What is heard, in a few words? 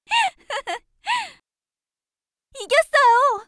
get point; Shua